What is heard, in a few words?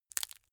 crack knuckles